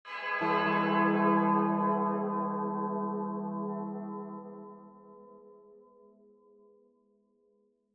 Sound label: void bell ring; Minecraft